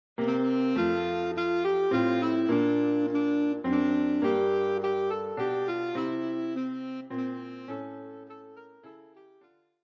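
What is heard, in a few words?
We wish you a Merry Christmas, alto saxophone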